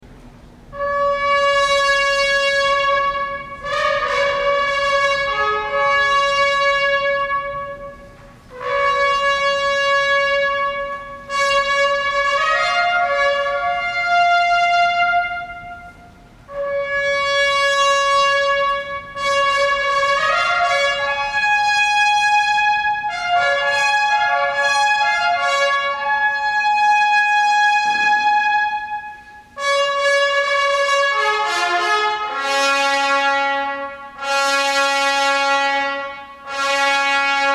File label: trumpet; Reformation Sunday 2011